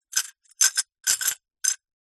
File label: household broken ceramic cup pieces movement inside cup